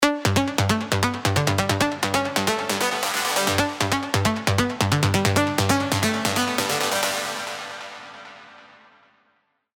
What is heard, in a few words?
trance plucks; Spire; alonso; spire trance plucks vol 3; Return Of Trance